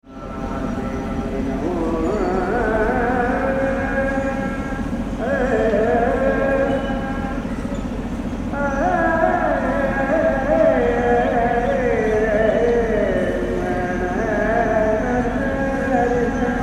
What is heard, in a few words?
madurai dawn, india